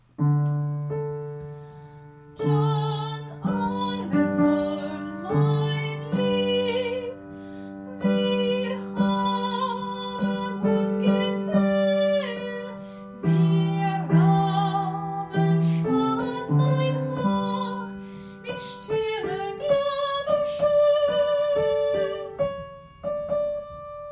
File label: soprano